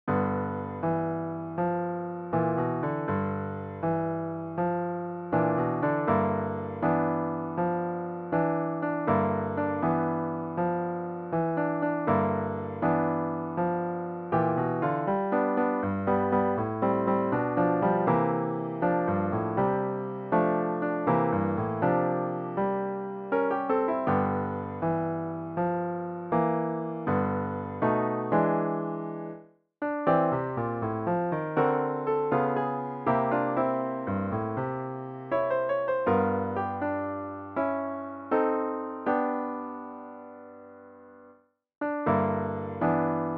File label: blues; birthday